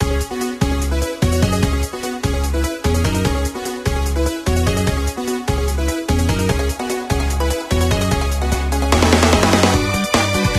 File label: Dungeon